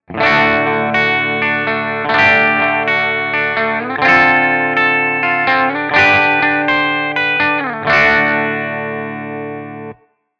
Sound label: guitar Twang Reverb